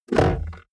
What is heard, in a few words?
car bmw e46 interior center console open hard